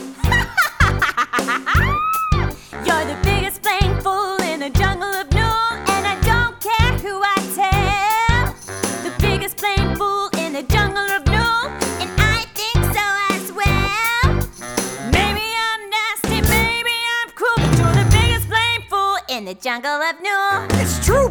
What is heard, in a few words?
vocal